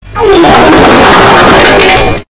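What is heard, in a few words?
loud fart sound but its slow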